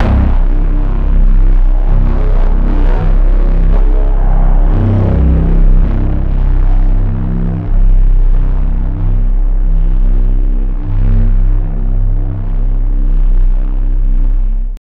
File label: boink metallic harsh bass